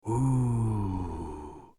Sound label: Oooooo Cartoon